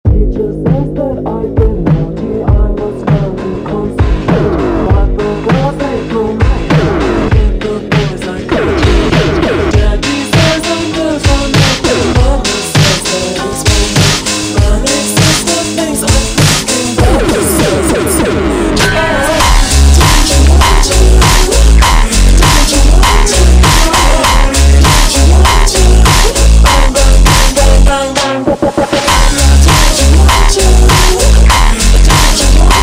tekk super Slowed

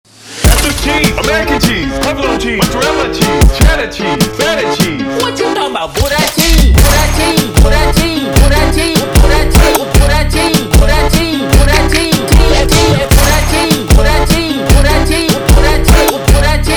Brega slowed; funk

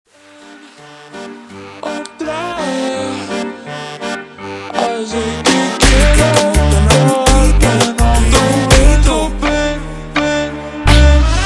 slowed, funk

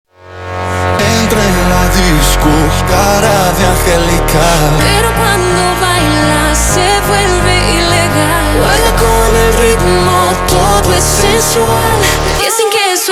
slowed, funk

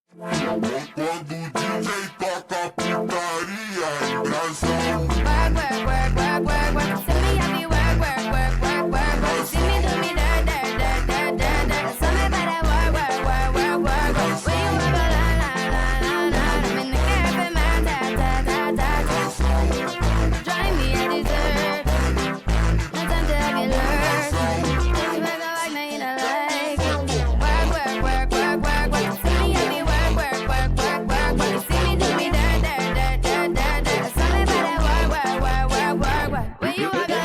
WORK FUNK

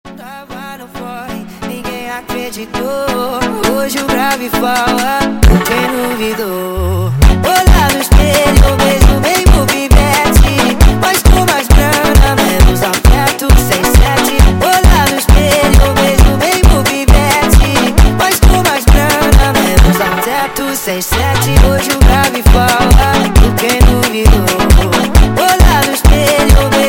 sped Up, funk

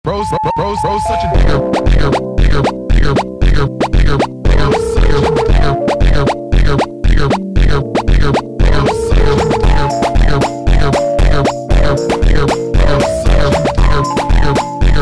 funk slowed

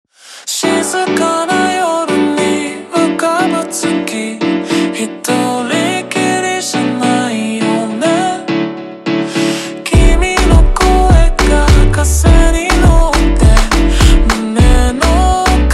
Super Slowed, funk

